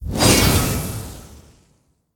combo; smite; god arthur

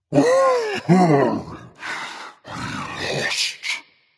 boohoo berserker; cuchulainn

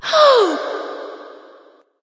breath light; smite; voicepack; hel

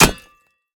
sword hit; smite; olympus

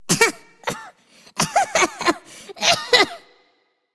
clear throat; Smite; ymir v2 skin19

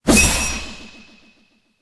slash; smite; daji

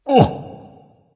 grunt lift dragon; fafnir

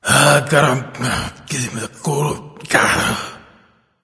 grumble; cuchulainn

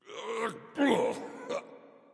grunt; Smite; bacchus

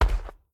foot step; smite; zhongkui

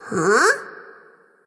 nv confused; cupid

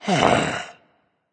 sigh berserk; cuchulainn

